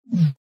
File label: contact close